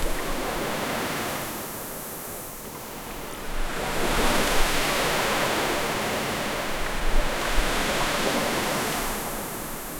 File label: ocean waves gulls, coastal